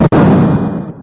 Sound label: explo, video games; xevious